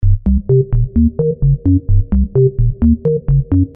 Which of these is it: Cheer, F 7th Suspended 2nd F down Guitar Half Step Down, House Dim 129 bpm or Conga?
House Dim 129 bpm